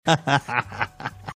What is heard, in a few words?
short laugh